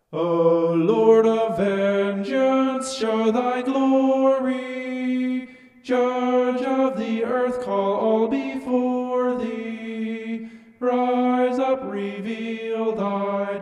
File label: vocal homoph; psalm 94